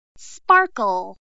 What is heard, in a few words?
sparkle